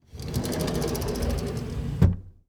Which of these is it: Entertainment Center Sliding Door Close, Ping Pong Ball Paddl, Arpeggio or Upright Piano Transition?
Entertainment Center Sliding Door Close